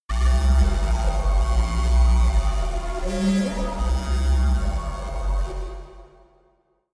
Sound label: space inside biomedical alien power plant busy